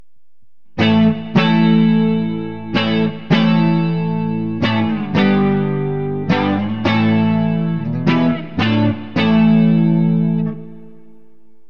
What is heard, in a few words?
neck clean; Burny